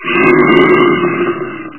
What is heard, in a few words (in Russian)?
groznij rik starogo medvedya